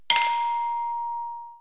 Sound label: gas; KABOOM! - Wild and Wacky Sound Software; citylife